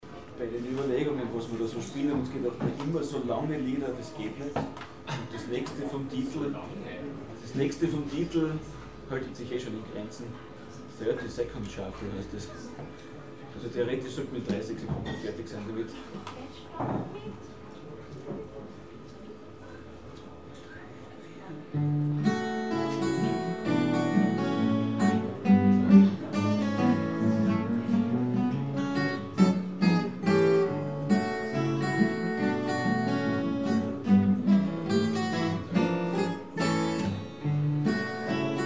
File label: shuffle